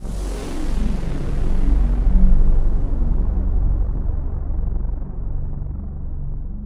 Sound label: Dark Sweep, Club Techno, 144 BPM; Zero-G; Construction Kits; Kit 6